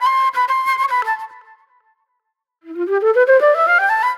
Disco Flute

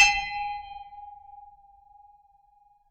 FX BELL; Univers Sons - Raricussions

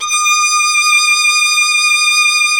Synclavier Strings; ILIO

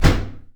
BOP, Anime Sound FX; AKAI Anime Sound FX

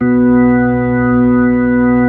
B-3

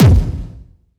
Electronic Kick; Yamaha PSR E305

